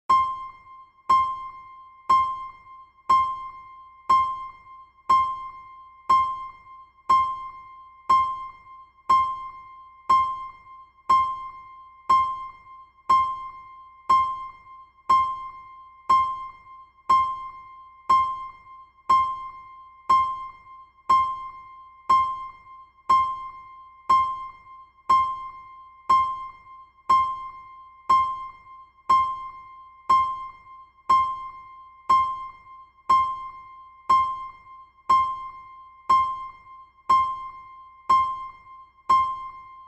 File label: metronome